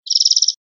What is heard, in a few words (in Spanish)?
cortas, verderon